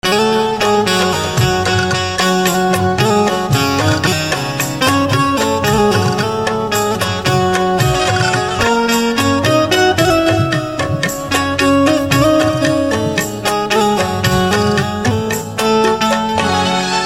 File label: Banjo Instrumental Ringtone